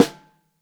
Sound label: SN3 Top, snare; Ableton Foundation FreePacks; Snare Designer